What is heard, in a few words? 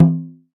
Ano Drum; Multisamples; Digital Sound Factory Planet Earth